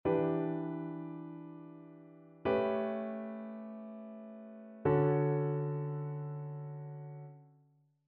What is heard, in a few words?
ii V I progressions; ear training